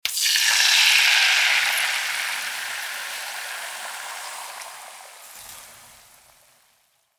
Meat sizzling pan cooking; household

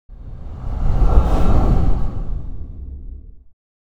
Spaceship pass by fast

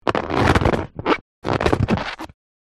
Inflatable beach ball handle squeak

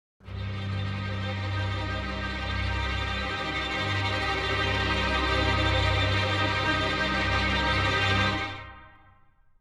Riser build up cinematic tension suspense

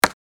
Monopoly board game token single move

